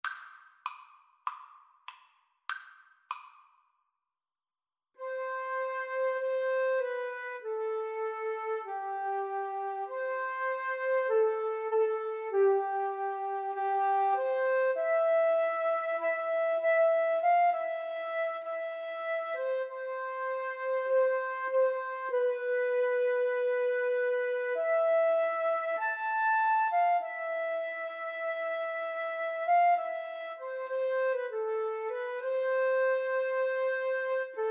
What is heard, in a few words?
Flute Duet